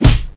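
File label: flesh hit; Nevergrind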